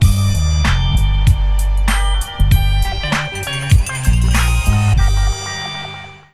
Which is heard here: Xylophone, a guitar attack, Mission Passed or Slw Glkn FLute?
Mission Passed